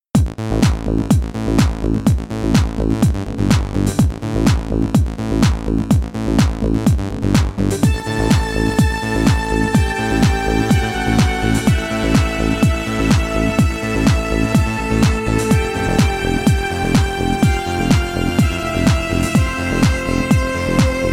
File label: Trance waves